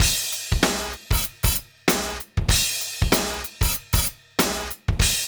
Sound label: DRUMS; Funky Ass Loops; East West